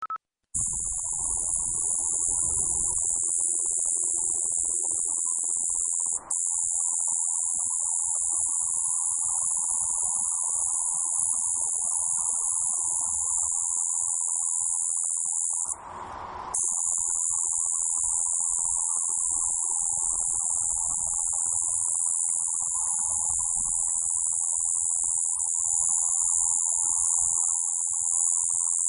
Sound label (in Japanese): shizuoka kubikirigisu; ヤブキリ